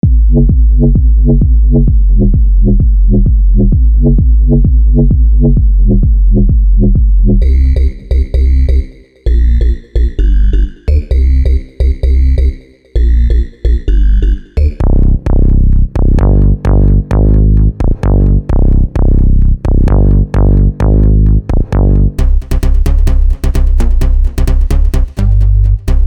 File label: midi bass